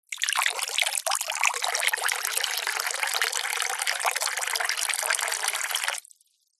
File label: household water pour into metal bowl from cup lightly trickle; zapsplat